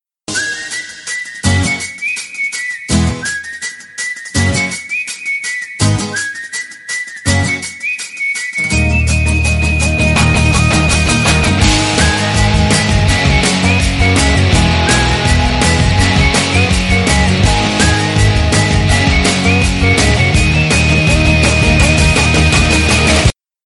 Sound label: bgm ringtone; tamil; kadhal 2 kalyanam